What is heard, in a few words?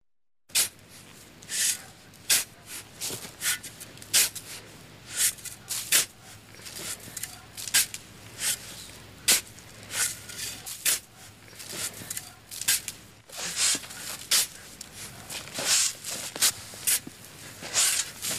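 2 people digging sand with shovels; lopatoj kopayut pesok 2 cheloveka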